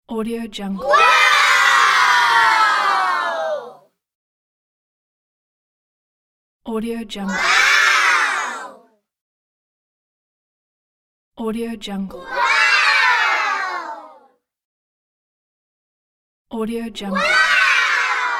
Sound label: children shout "wow"